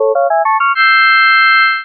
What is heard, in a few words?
poker jackpot win